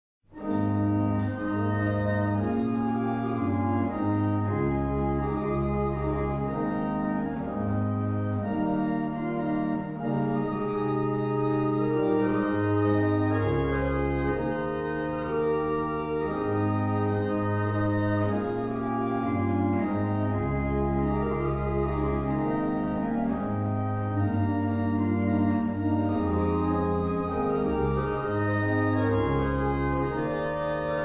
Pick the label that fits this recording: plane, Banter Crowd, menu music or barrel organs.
barrel organs